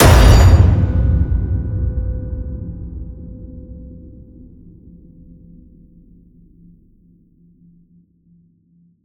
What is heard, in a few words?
HIT Massive, Cinematic Hits Designed